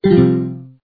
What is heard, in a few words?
Talk End